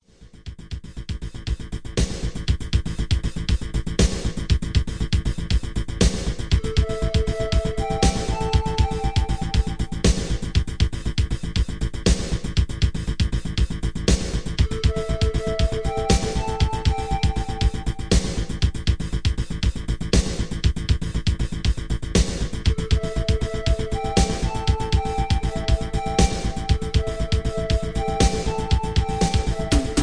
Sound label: FAST BG